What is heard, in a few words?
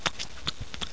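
SUCKLE, kittens; CATZ; KITTENZ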